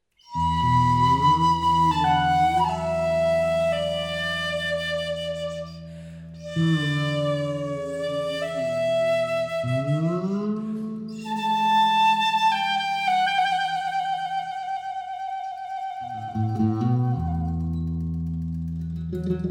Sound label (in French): Piccolo Guitare Fretless